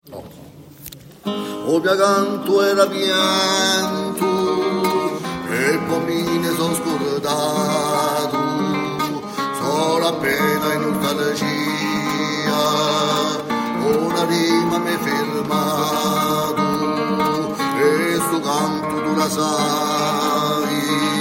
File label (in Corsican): U mio cantu basses